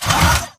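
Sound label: Stalker Movement Servo Heavy Short; Titanfall 2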